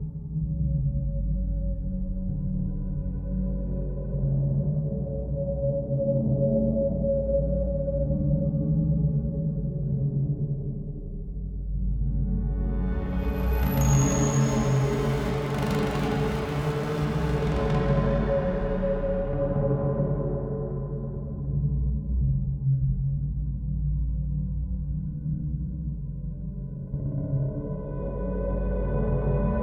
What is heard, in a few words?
Drone,Musical,Resonant,Swells,Slow,Forceful,Longing with Flutter,Swells,Resonant,Sporadic; Rattle